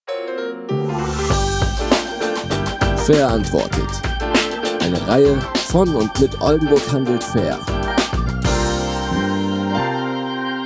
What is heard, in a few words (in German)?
Radio Jingle; Fairantwortet; oldenburg handelt fair